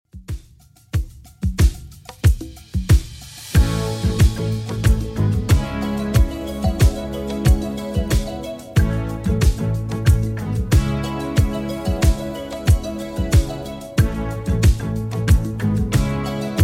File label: 80s Redrum 92bpm